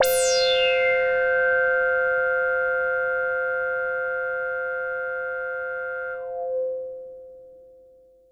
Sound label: SYNTH Silver Bird